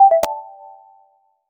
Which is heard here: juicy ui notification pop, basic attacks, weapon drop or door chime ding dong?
juicy ui notification pop